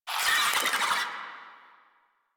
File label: Infested Ashen Vocal Attack; Warframe